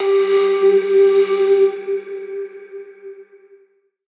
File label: tonal oneshot G; Pop; Slate; PASADENA Pop Sample Pack; Tonal One Shots